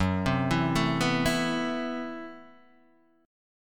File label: A Minor 6th Add 9th F sharp, Chord